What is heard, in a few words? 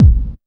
KICK hardcore, Techno Trance DRUMS